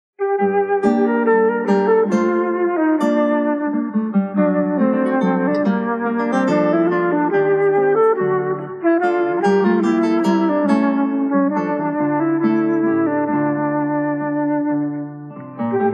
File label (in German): courante, querfloete; charles dieupart